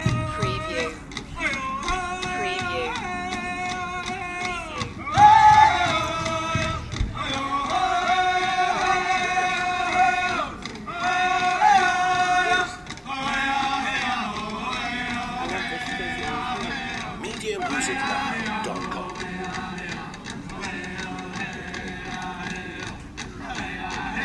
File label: Pow Wow Chant